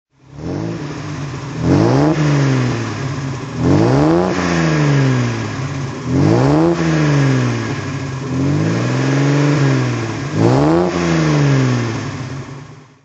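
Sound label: mercedes 300s roadster throttle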